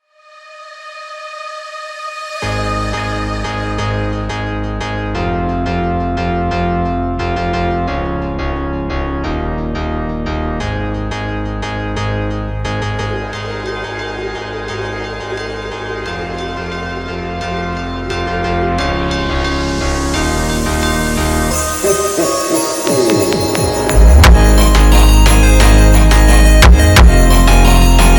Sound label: JINGLE BELLS FUNK